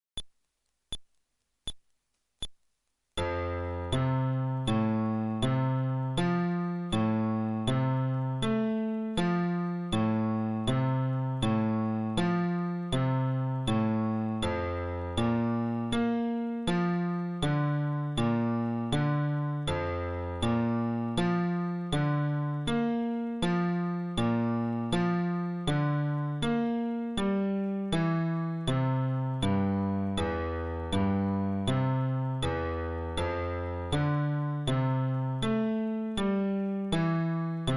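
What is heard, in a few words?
Arpeggio テンポ80, フレットレス bass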